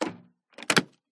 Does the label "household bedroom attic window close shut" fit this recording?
Yes